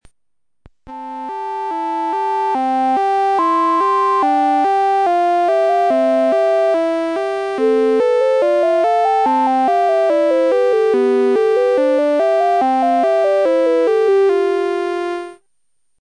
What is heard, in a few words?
Sonata C